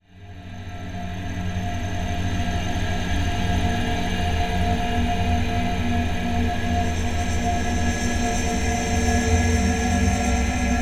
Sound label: soundscapes and drones